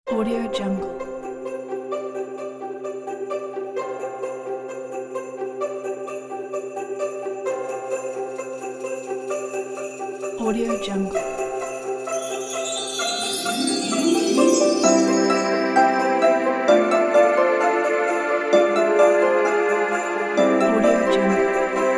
christmas bells

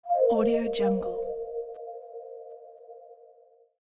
news time; soundmasterfx

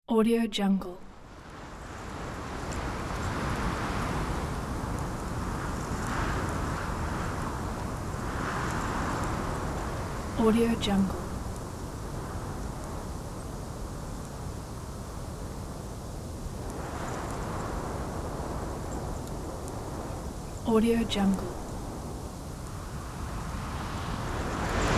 gusty wind

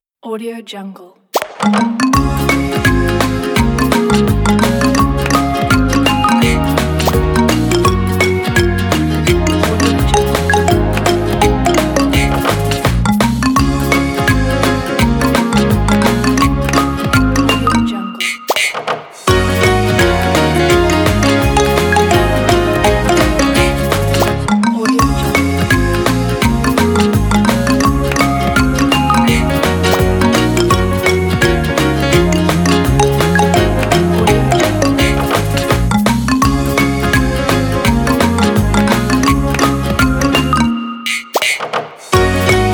happy kids kit

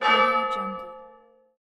church bell

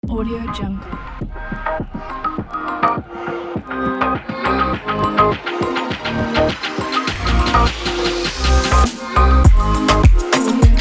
tropical house dance pop

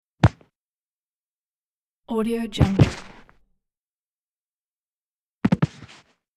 body falls on carpet; hollywoodedge

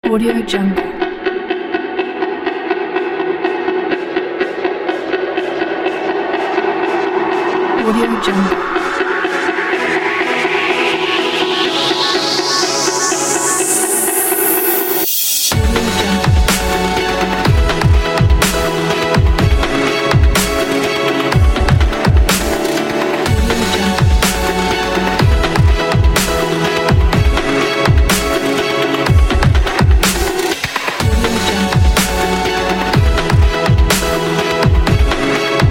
cinematic dubstep

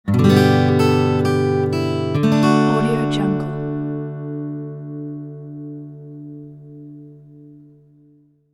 acoustic guitar melody

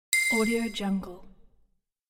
bells sound

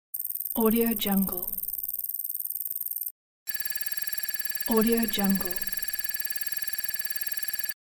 futuristic score counter

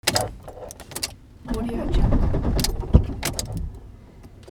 car start up fail

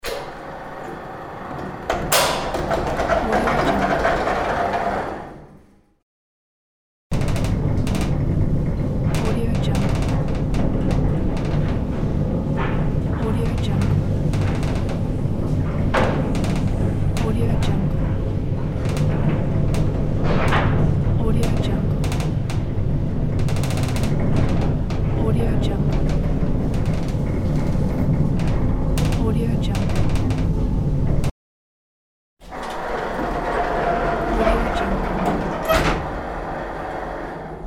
elevator

